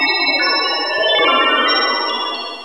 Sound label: churn